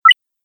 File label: Sine Wave Confirmation, beep